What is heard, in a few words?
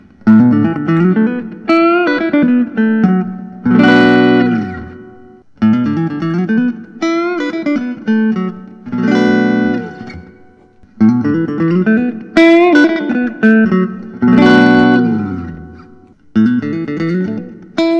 suhr collection series t; natural